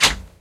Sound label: spin start; In The Forest; Wazdan